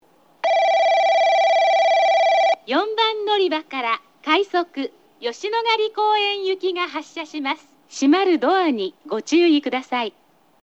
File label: balloon saga rapid yoshinogari koen, portable recording